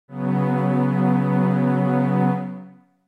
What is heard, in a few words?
B Suspended2